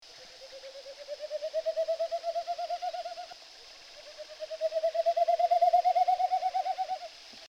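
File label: snipe, birds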